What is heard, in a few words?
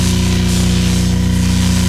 Elec Buzz heavy loop